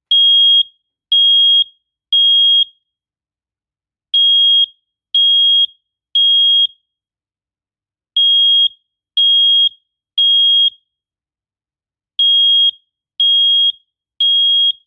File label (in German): Alarmton, rauchmelder; GLORIA-R-10